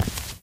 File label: walk tile, footsteps